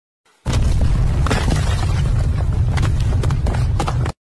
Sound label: Cracking ground; سینمایی